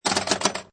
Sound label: Pinball Sound Multiple Bumpers Or Switches